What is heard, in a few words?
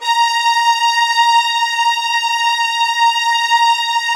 A#5 SUS, LOUD VIOLINS; Q Up Arts; Denny Jaeger Master Violin Library